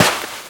foot dirt sand, footsteps; Call of Duty Modern Warfare

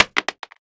556mm shell wood; Escape from Tarkov

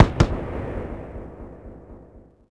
50cal 2shot far

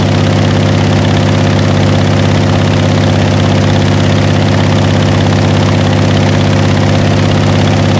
pt76 engine rpm load; War Thunder

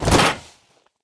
bodydrop proflist; Escape from Tarkov

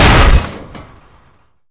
Aircrafts Uh-1H Mast Bumping; DCS

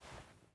ironsights up; Rust

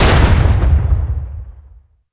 Hit Med, Damage; DCS

